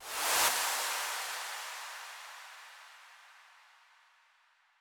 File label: 134BPM ReverseFx; Sound FX; Cmajor